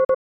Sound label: Beep